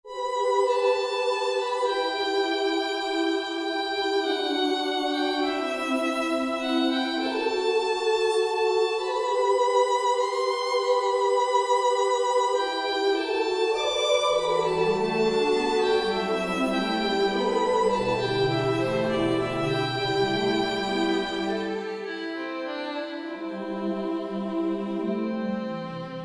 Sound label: Classical ocean flight